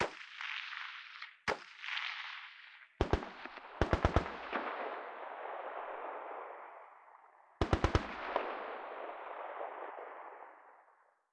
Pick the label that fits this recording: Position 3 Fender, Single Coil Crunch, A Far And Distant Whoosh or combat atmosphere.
combat atmosphere